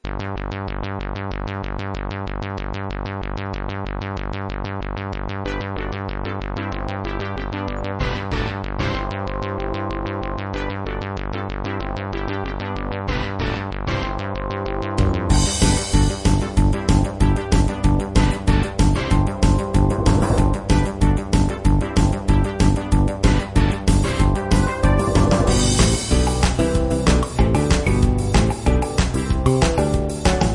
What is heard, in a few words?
instrumental